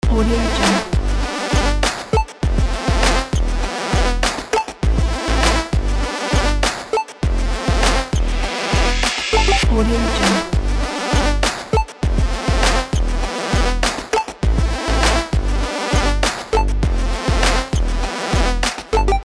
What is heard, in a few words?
arcade hip hop loop